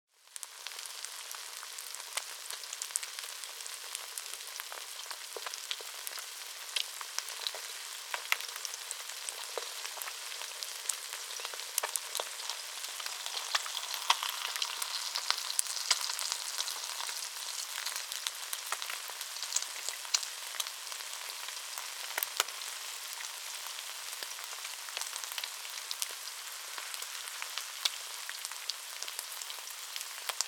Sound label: frying pan sizzle; micromayhem